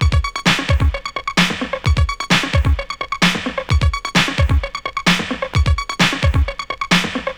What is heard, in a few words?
130 Pop Combo Beat C#maj; Digidesign; Pro Tools; Pro Tools Essential Loops; Pop Rock